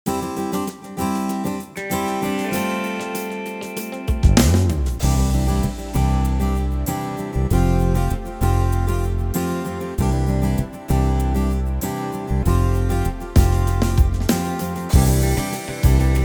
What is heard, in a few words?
Dreidel Backing Track